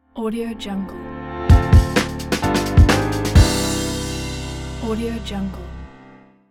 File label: short and sweet upbeat logo